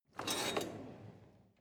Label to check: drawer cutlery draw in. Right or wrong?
Right